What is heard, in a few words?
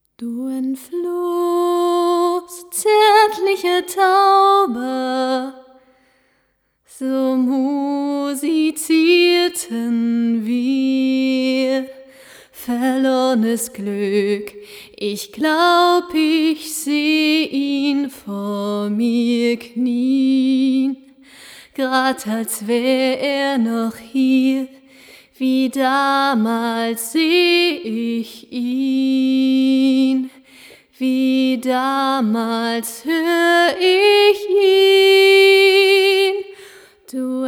acapella